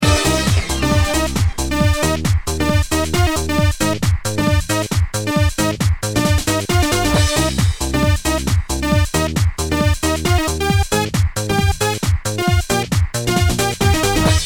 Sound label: instrumental